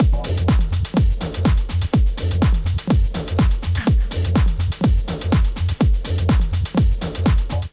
Tomorrow Kit 124 bpm; MIDI Clips; Ableton; Punch and Tilt

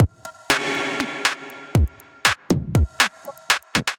Kit, Drums; Blowin Kit; Ableton; Factory Packs; Skitter and Step

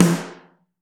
Snare Mono

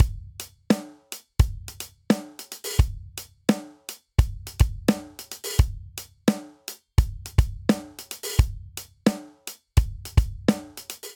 Inbetween Hip Hop 06 Verse Variant 86 bpm, drum; Drum Booth

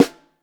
SN3 Top, snare

